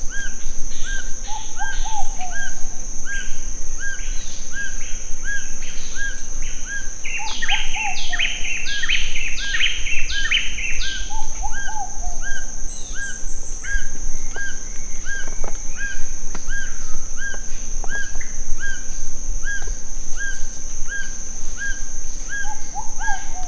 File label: Black Bushbird; پرنده بیشه مرغ سیاه